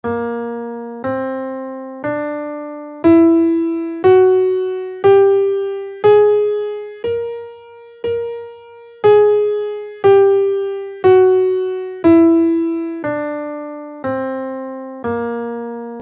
lydian augmented dominant scale in Bb

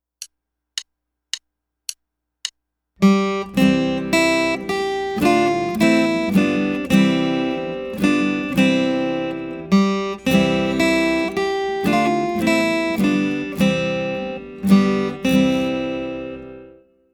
One Note Pick Up

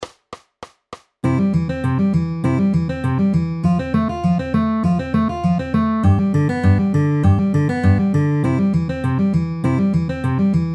C F G C downward arpeggio ring middle at 200 bpm

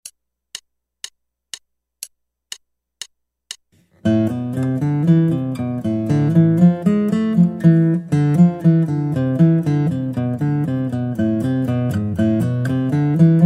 Guitar

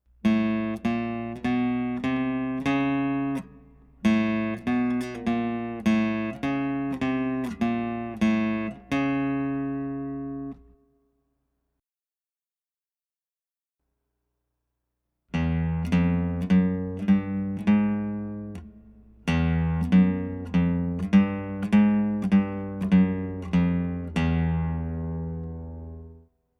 Sharps 5th 6th String

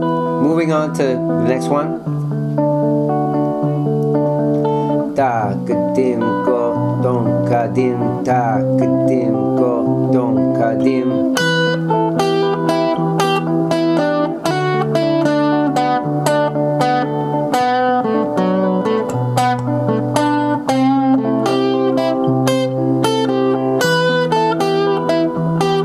claves to rock vamp

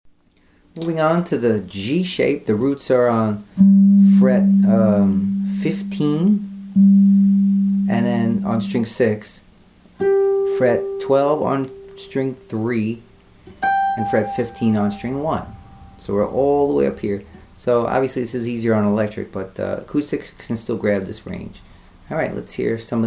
easy blues licks key G shape G

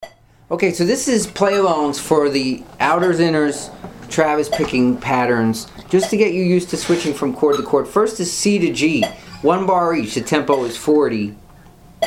travis picking outer inner C to G at 40 bpm

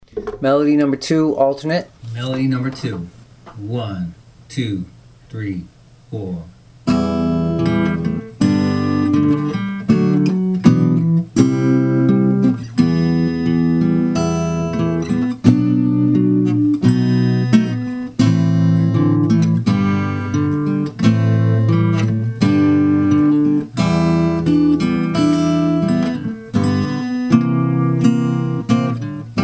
melody alternate

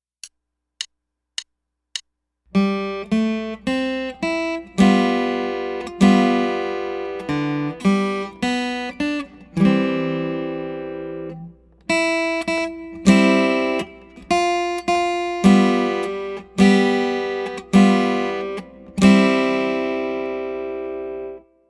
Chord March

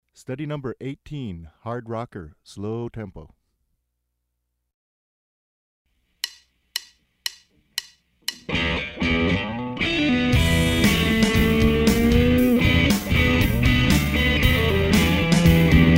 Hard Rocker Slow Tempo

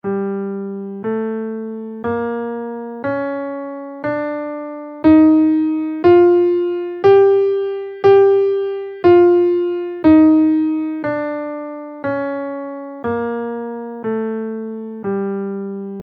romani minor scale in Gm

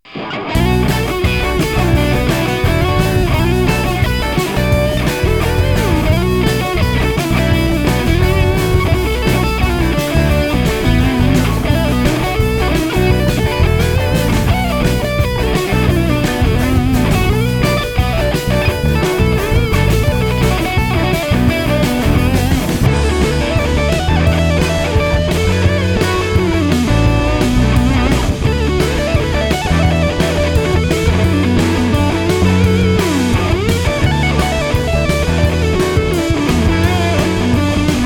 minor pentatonic

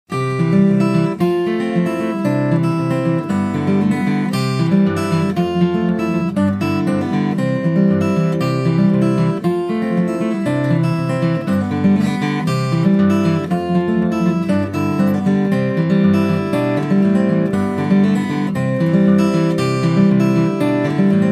travis picking

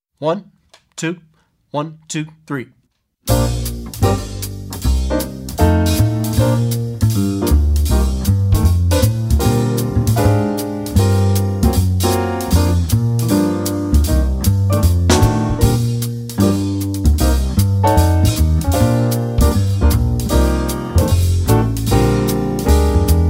Without Soloist

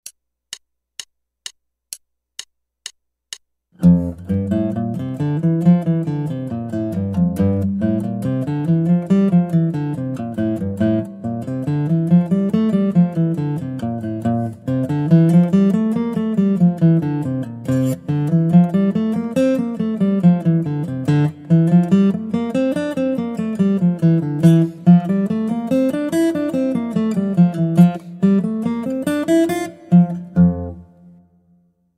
Guitar

